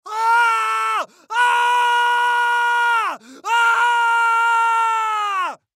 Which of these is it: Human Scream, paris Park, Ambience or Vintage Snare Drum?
Human Scream